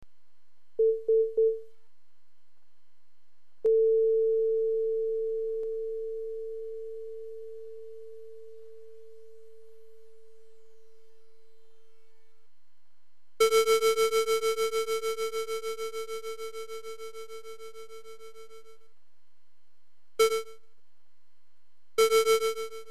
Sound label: synth